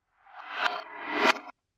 Haunting Reverse Spell; SoundsCrate

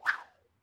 cord whip whoosh; soundscrate

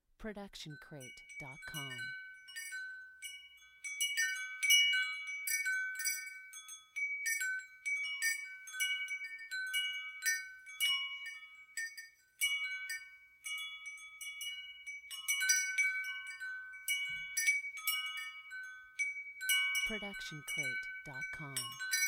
Wind Chimes

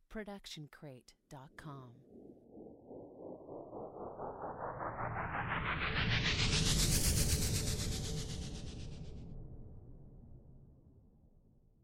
Cinematic Riser

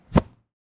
block from hit

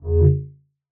mid space ship short fly by; soundscrate

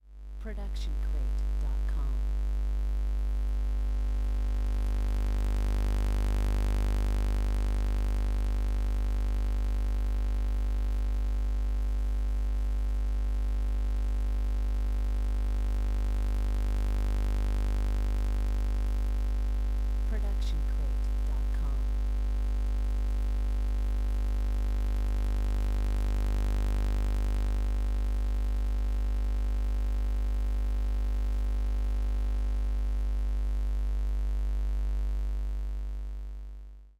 TV CRT Ground Noise 50Hz EU; SoundsCrate

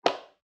footfall heel; soundscrate

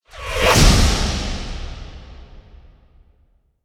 magical slice; soundscrate

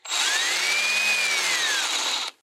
drill revving; SoundsCrate

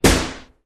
bullet impact wood

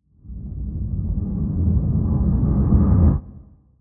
large whoosh; soundscrate

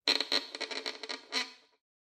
Violin Scratchy Noises Short

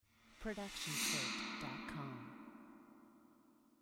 gong swipe

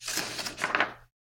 shuffling papers

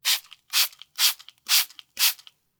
cleaning spray 5 times; soundscrate

